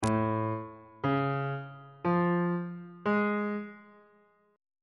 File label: a augmented major 7th; f sharp melodic minor 7th chord 3 is